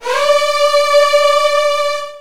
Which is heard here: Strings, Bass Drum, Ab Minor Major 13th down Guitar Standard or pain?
Strings